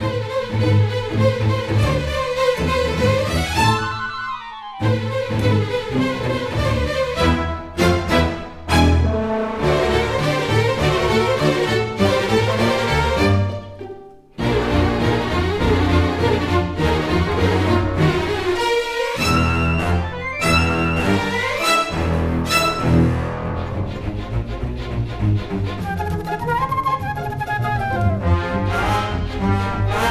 The Great Lava Pursuit (Strings); rayman legends the complete soundtrack gamerip 2013

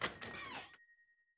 Store Bell

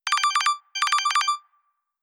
Phone Ring; Welcome to the Game II

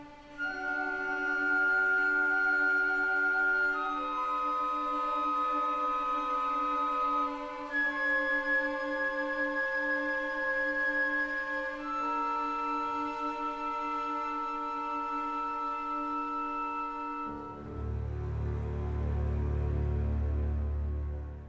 Ambient Loop; Chrome

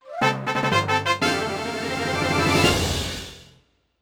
Jingle Boss Clear

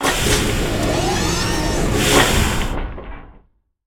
metal Jaw Power Up

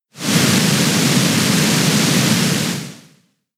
Rain Dance; attack moves; Pokemon; gen 7; SUMO; USUM; Rain Dance part 1

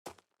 SFX run gravel

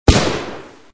Gun Shot; Extra Lives

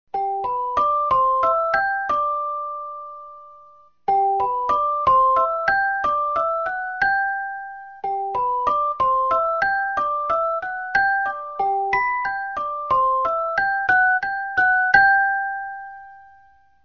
lullaby; Oswald's lullaby

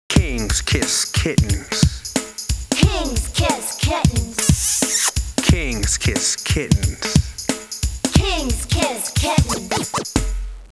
Song Letters k K S O N G; School; Fisher Price Ready for School Kindergarten

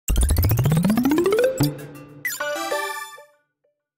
N-Gage Startup

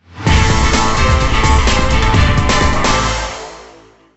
Jingle Classic; Deal or No Deal Special Edition; NDS

